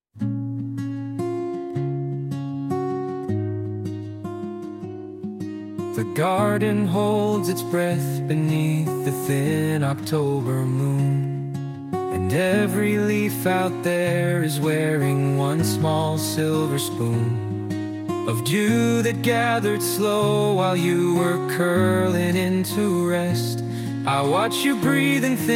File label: lullaby, name songs; regina